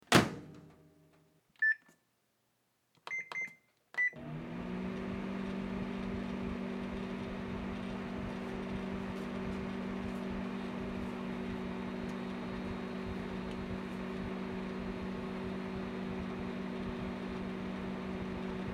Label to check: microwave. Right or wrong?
Right